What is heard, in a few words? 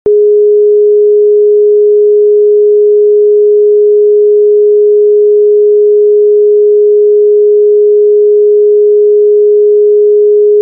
417 Hz Sine Wave Sound Frequency Single Tone; Solfeggio